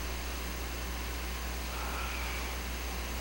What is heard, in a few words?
labor breath